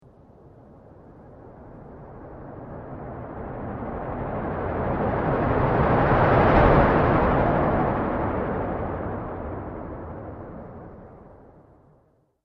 Spinning Horror Transition, transition sound effects